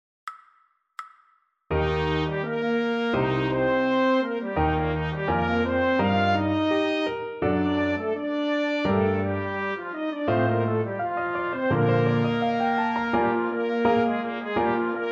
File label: trumpet